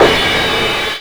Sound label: CRASH, Drums Dance & Groove